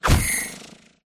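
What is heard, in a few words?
pterodactyl block; pterodaktilj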